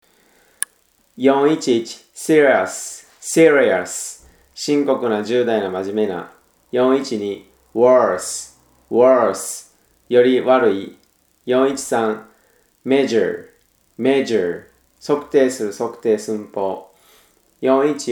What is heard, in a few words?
tango